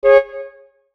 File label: CHIME STAB LIGHT; Chimes (Sound Effects:Multimedia)